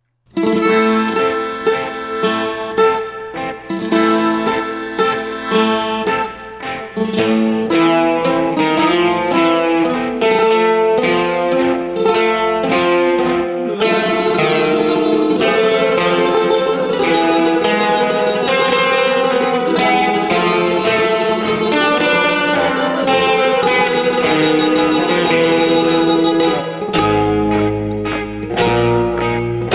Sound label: Hungarian minor